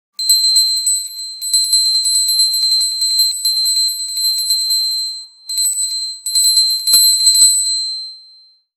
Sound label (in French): cloche